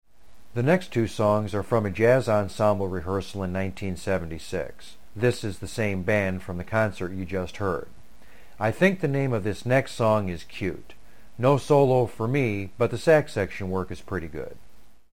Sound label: sax